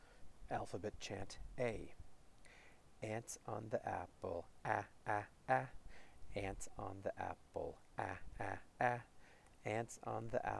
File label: alphabet chant 'a' fast